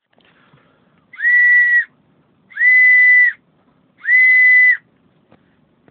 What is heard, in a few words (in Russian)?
manok na fazana